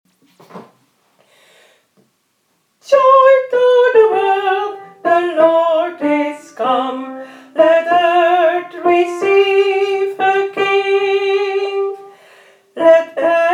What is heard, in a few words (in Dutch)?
mezzo